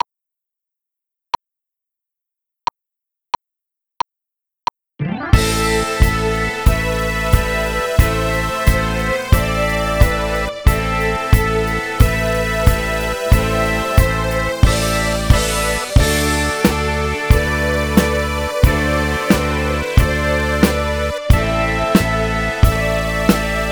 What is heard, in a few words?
AC Rock Keys Version